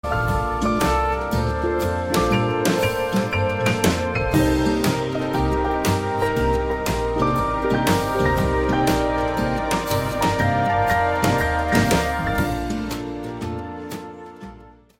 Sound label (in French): JAZZ SANS VOIX